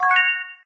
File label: chime ascending